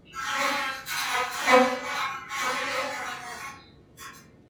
flies; ambience; dfcaverns

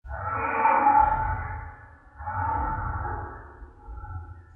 horse neigh; dfcaverns; ambience

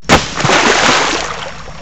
snowball splash; christmas craft

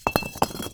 brick step; dfcaverns; hunter statue

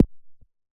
hard footstep; minetest game

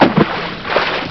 snowball splash; christmas craft

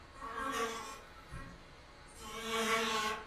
flies; dfcaverns; df ambience

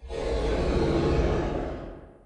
horse neigh; dfcaverns; df ambience

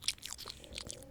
mushy chew; farming; dfcaverns

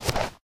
default grass footstep; nalc game

